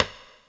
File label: Rimshot